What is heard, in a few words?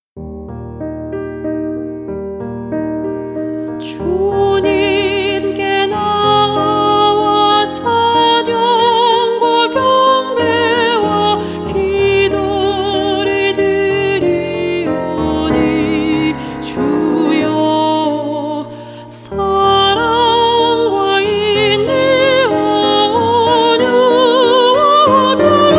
SOPRANO